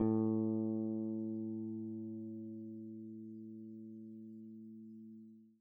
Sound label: a3 open p, swagbass